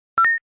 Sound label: coin